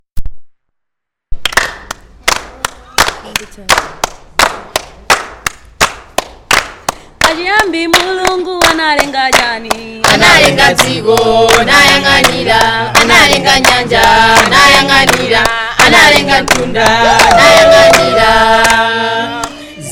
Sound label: Creation Song, Chichewa; Chiyambi